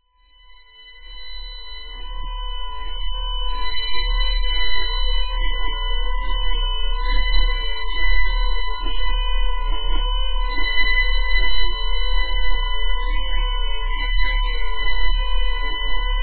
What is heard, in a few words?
Puffy Noise With Deep 11 Hz Bass